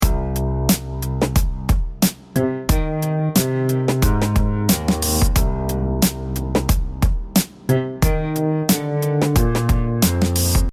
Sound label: keyboards beat